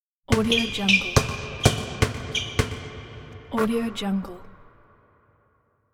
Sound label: basketball indoor dribble and score